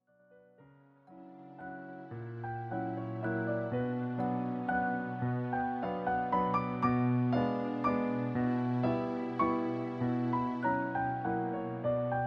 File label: Waltz B minor